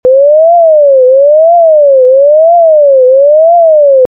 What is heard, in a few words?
Shelter